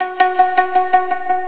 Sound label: suntur, stringed instruments; Sonic Foundry (Sony Creative Software); World Pop